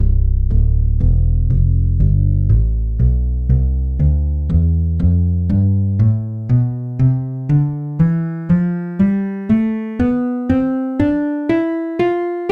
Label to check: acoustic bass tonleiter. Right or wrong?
Right